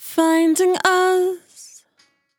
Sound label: 100bpm Bm, Velvet Vocal; Zero-G